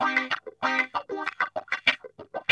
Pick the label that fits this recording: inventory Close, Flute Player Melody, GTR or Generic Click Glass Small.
GTR